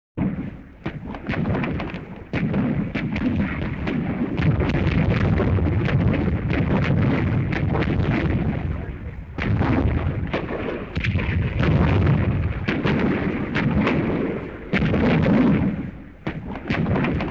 Explosion Distant Explosions, Battle Scene

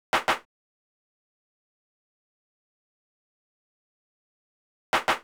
Gated Drum Loop 100 BPM Clap; Analog; Cymatics - ANALOG EVOLUTION Beta

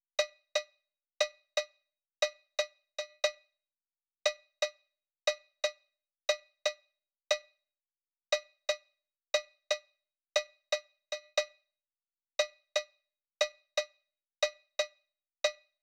Drum Loop - 118 BPM Muted Cowbell; Loop Stems; Cymatics; Pacha Drum Loop

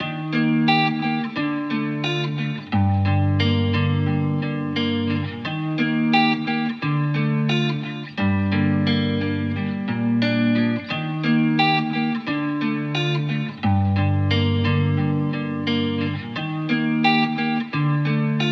88 BPM G Min, Guitar; Forest; Cymatics; Miami Guitar Pack - Pt 1

